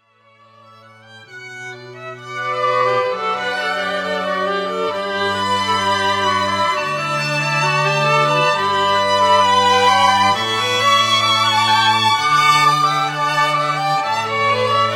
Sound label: Classical Ensemble, Pachelbel Canon